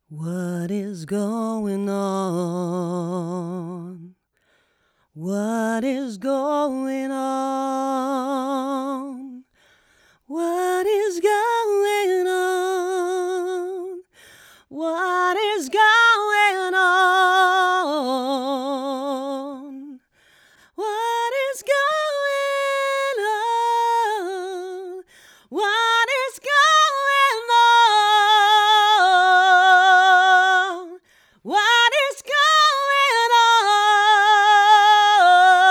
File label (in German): Tube Tech PE1C Vocals Female roh